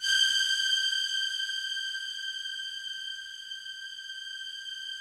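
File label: Yamaha VP-1, rough strings G5; 90 s Sample CDs; Music Store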